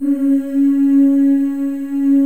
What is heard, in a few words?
FEM, Symphony of Voices; Spectrasonics